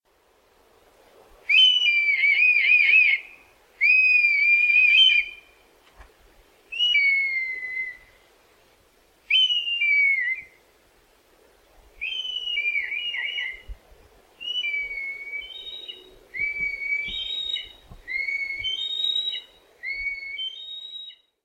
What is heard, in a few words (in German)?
Rotmilan